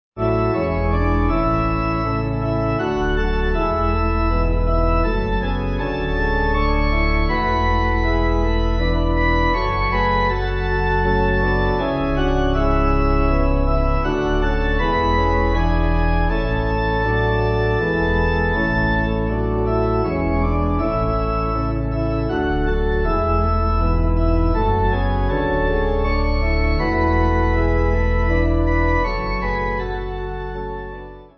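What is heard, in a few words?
Pipe organ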